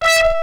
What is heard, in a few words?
CHRM BRASS E5, RHODES CHROM; Greytsounds VOL-1